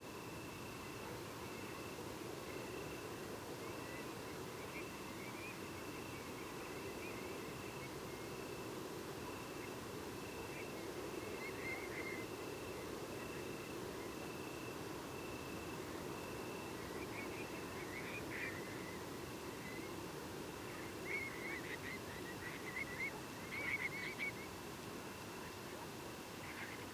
scratchy

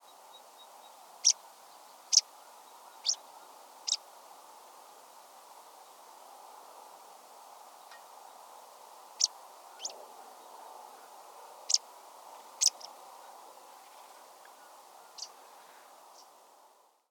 African Pipit Anthus cinnamomeus rufuloides

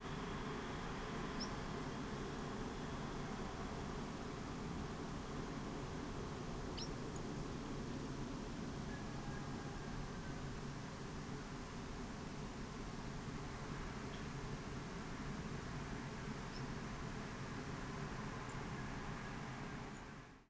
Black Redstart New NFC 4 Calls

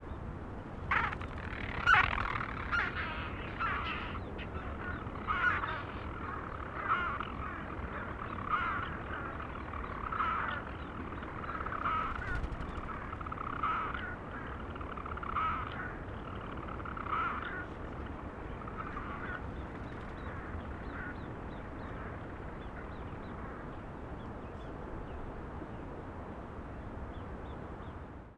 purr flight

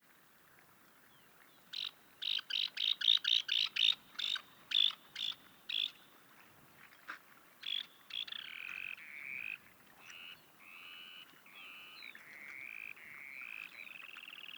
flight calls song

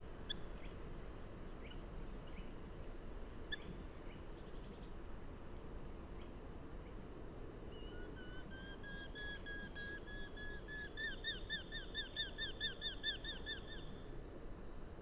Eurasian Hobby Adult Response To Intruders

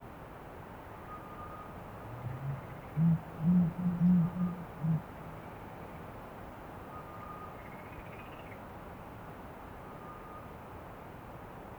Turkish Fish Owl Slow Hooting Probably Of Male

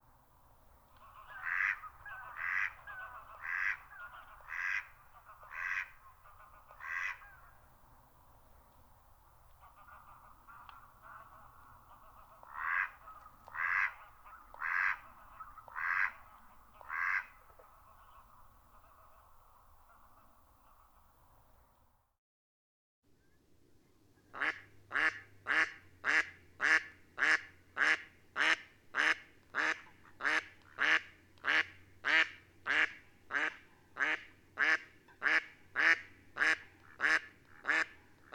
Calls of a male followed by a female

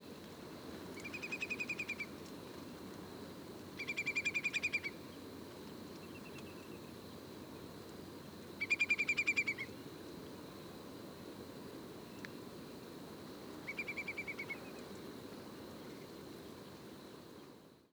flock

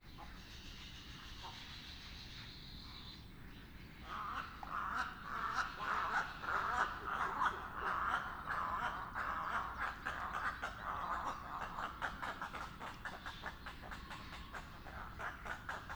Little Egret Calls Of Adult Arriving At Colony

